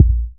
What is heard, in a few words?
natural steel kick drum a sharp key, bass drum single shot tuned to the A# note; kick drum samples